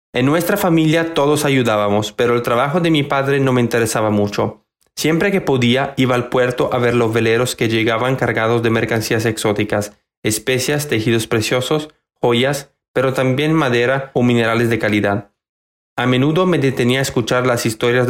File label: piano